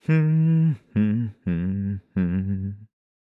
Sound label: voice humming